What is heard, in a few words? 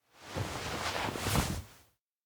hug from behind; anemoi